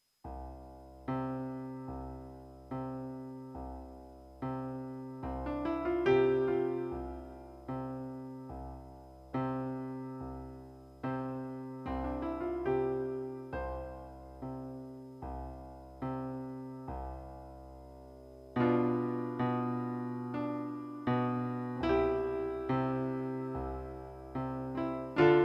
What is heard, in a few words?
Piano Sorrow Instrumental